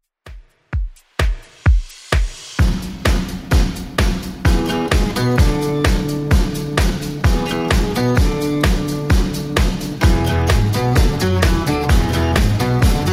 60s Redrum, 129 bpm

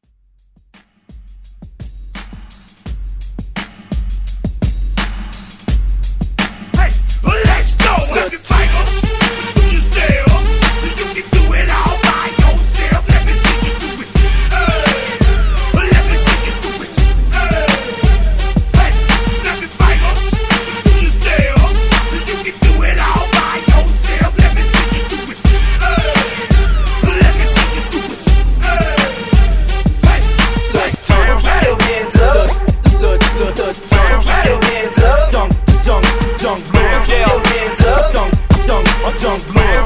Throwback Hype Redrum

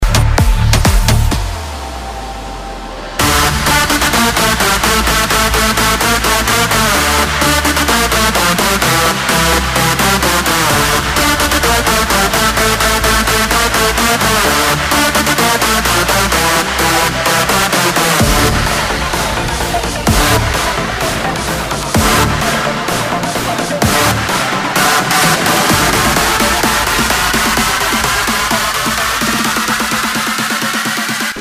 Energy Short Cutz 128 Bpm

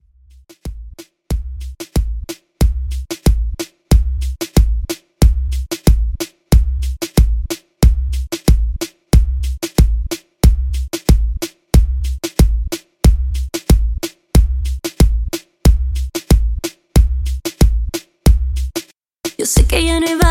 In Outro 92 Bpm

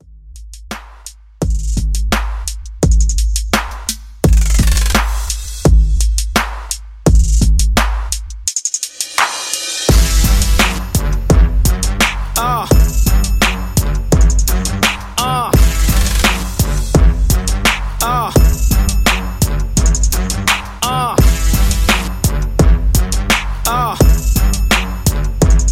Remix Redrum 85Bpm

